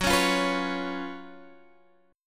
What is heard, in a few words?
F sharp Major 7th Suspended 4th F sharp, Guitar Half Step Down